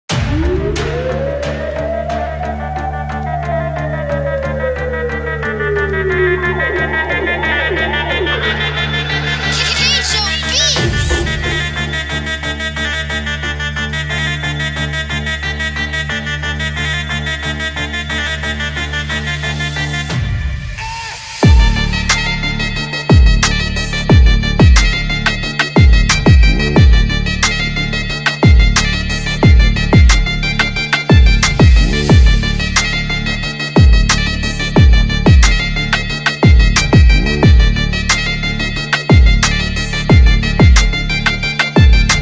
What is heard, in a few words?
Drum Kit; Kejoo Beats; Keleş Drum Kit, Library Pack